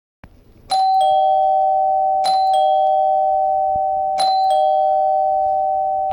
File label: Door Chime Sound